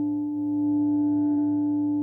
tuning fork; Hollow Knight

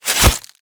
Fight Attack Hornes; mighty party 2017 gamerip pc ios android

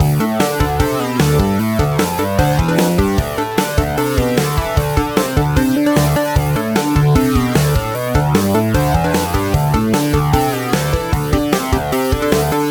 Band Loop; The Adventure Pals

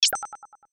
Notify Warning; gamerip; SAO Utils 2

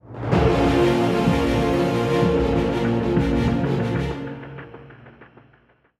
190bpm; Legends of Pegasus; windows gamerip 2012

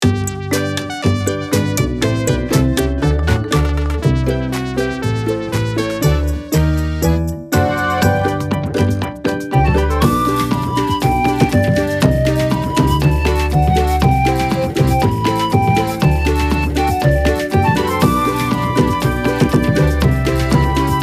BGM town; DENPATOWN

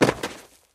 Body Landing; Friday the 13th: Killer Puzzle

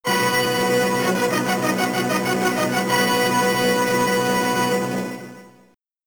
Logo; Unico

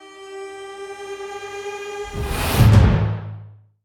Boss Fight

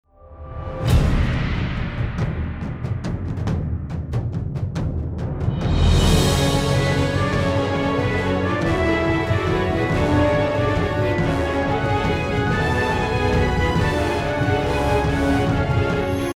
Battle Intro; Infinity Blade 2

